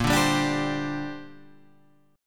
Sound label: Bb 6th Add 9th Bb Guitar Half Step Down